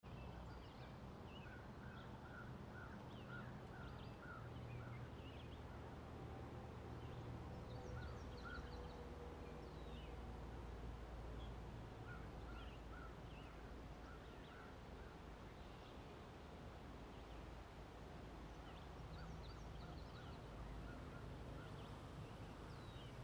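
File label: Room Tone Air Exterior Light Birds Distant Road; Southern Ontario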